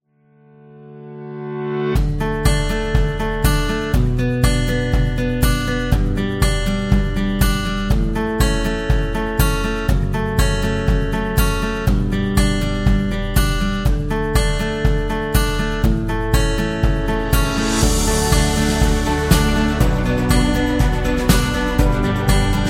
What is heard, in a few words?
Cinematic Indie Folk